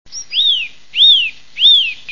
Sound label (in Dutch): boomklever